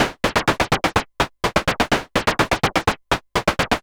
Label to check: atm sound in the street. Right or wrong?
Wrong